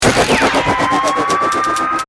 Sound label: Hit Ascend